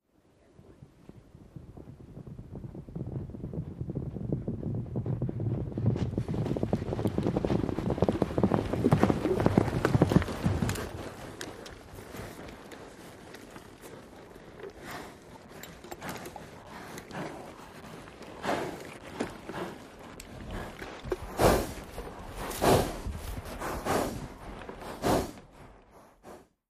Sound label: Group Horses Crest Sa